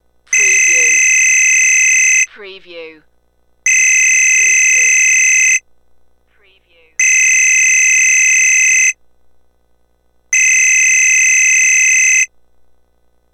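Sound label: Telephone 4 Rings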